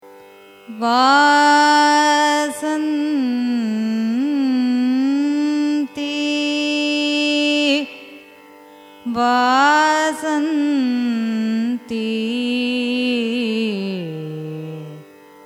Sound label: raga vasanthi signature, carnatic music